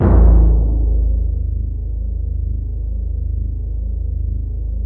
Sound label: timpani, Yamaha TG-500